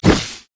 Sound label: lich wall of pain; karthus